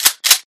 pickup weapon; soldiersfury

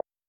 touchscreen button tap; VideoPoker

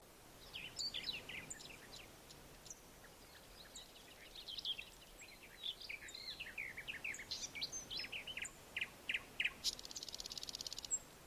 ambience germany; lightning speed

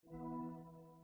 race lights count; lightning speed

